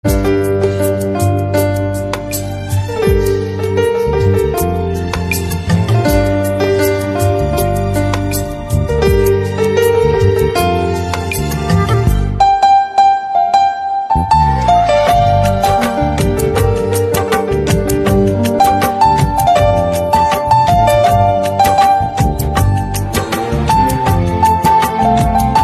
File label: Piano Instrumental Ringtone